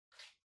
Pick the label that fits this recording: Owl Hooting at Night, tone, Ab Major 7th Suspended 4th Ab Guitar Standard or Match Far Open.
Match Far Open